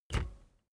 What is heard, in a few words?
bicycle street brake press